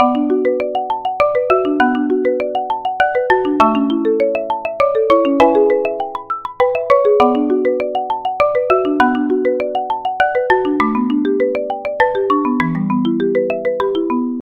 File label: MARIMBA